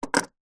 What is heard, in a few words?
household padlock metal combination set down on solid wood surface